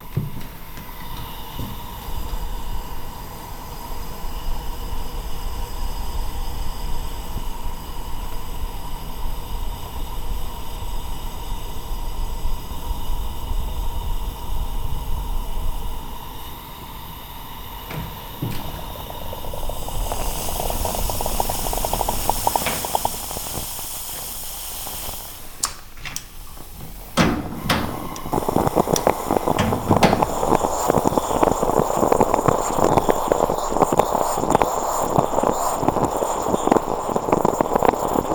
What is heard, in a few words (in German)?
Kaffeekocher Aufm Herd